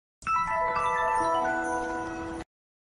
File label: Flashback Sound Effect; Arthur